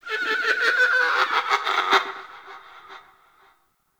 Forest Distant Medium Creature Small Call; Ambience; Warframe; Grineer

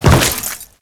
Step Goo, Footsteps; Enemies; Warframe; Grineer

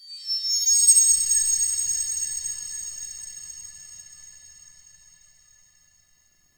High Shing; Lore; Fusex Preview

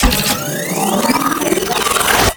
Charge Up; Warframe; Weapons; Corpus; BFG

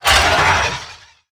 Melee Attack; Necramech; Battle Barks

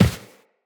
Step Default, Footsteps Walk; Tenno

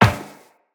Run Metal Perforated, Footsteps; Tenno

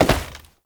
Step Wood, Foley; Warframe; Oraxia

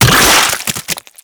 Impact Gore Large; Warframe; Weapons; Bullet Impacts

